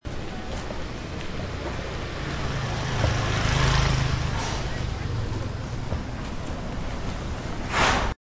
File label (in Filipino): sasakyan